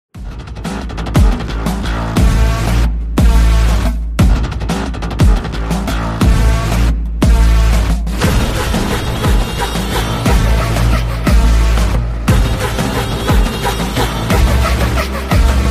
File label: Tamil bgm ringtone; Raayan